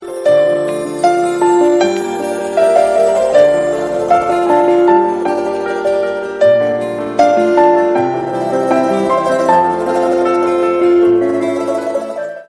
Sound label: instrumental flute romantic; jannat